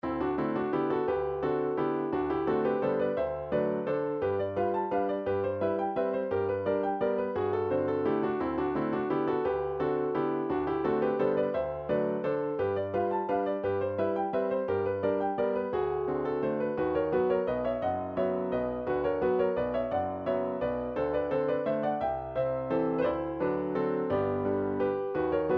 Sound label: waltz, midi